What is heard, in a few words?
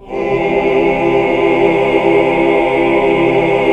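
chord 2 8 R; Best Service Gigapack 1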